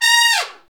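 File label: BRS, Brass Trumpets FX, Trumpets Falls; Tps Falls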